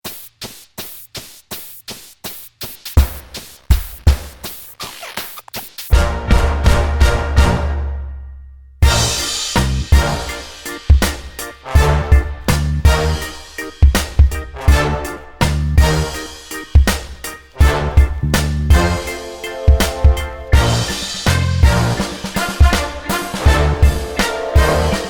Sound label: Karaoke